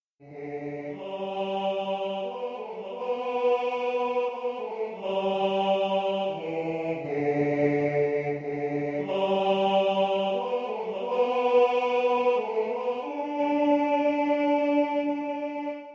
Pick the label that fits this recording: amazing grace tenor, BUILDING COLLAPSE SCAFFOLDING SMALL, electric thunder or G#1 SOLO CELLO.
amazing grace tenor